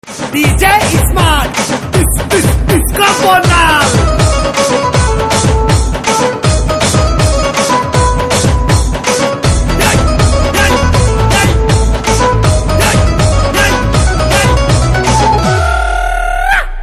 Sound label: Disco Ringtone; Bonal